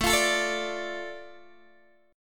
A Suspended 4th Sharp 5th down Guitar Open D

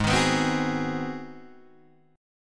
Ab 7th Sharp 9th Guitar Open D, chord

